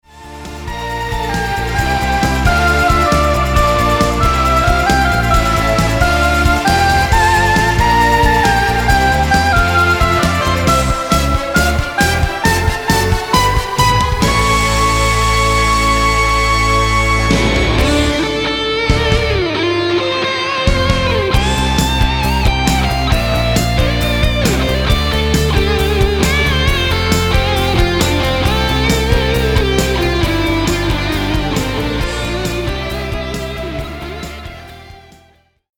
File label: Rock Oboe